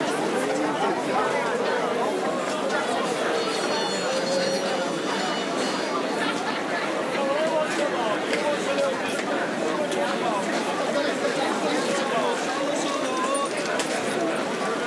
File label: Ambience Town Market